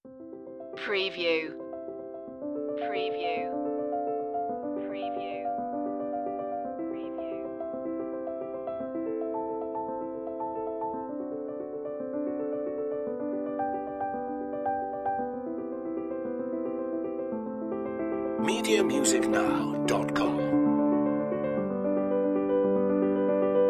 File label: prelude c major piano; bach; prelude 1